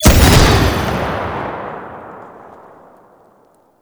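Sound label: Primary Projectile Explosion, Weapons; Warframe; Cephalon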